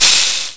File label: STEP LAVA